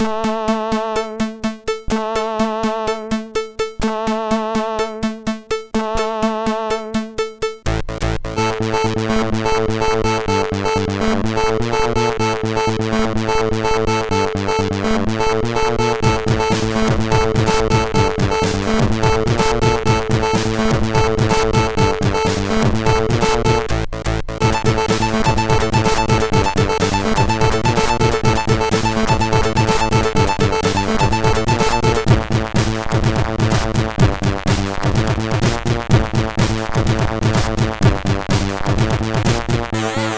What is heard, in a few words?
Tech MOS6581R3